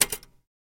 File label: Typewriter Bad Key; typewriters